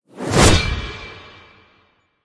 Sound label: Push Wipe; Ninja Tracks